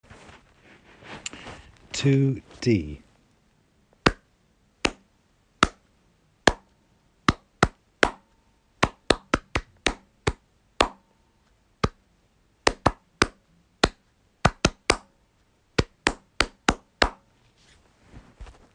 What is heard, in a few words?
rhythm